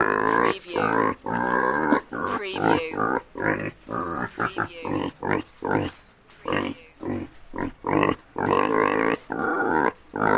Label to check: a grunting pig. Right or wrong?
Right